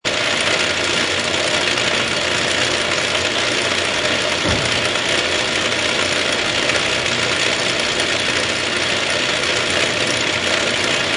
Drill Press, Industrial press run, stop and wind down.; Drills; Tools; Drill Press 01: Industrial press run, stop and wind down.